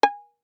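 Violin pizzicato fortissimo on D string Ab5 stereo; MIS Pitches - 2014; Strings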